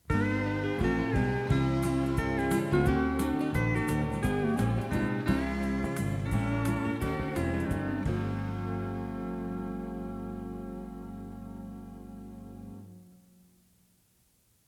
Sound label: country transition; Designing Women